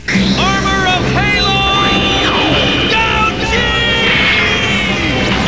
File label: cry